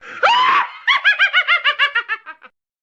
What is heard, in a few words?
wicked witch laughing sound effects halloween sound